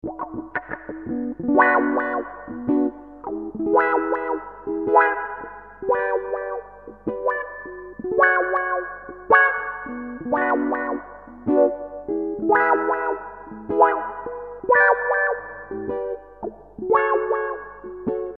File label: nursequacky mod clean